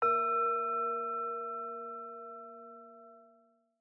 Singing Bowl; Samsung; Galaxy A56; Calm

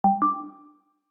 Bounce

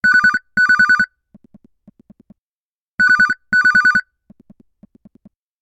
Office Phone, ringtones Retro; Samsung Galaxy S25 Ultra

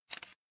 camera click

